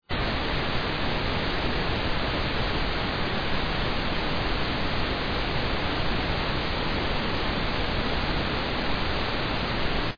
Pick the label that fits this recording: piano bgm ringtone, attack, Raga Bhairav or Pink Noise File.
Pink Noise File